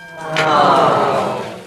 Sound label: letdown audience; gasping